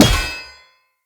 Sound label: Blunt Heavy Impact Metal; Weapon Impacts; Player; Environment